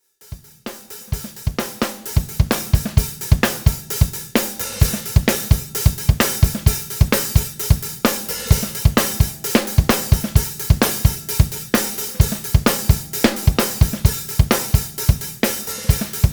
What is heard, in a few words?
rock funk syncopated